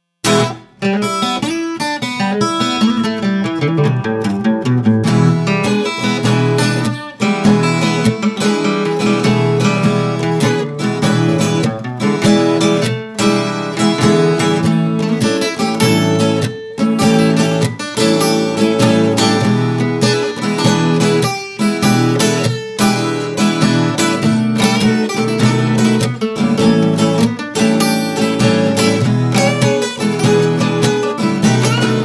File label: Acoustic Blues Guitar; classical blues; This classical blues will be perfect for western movies, drive videos with cars, alcohol.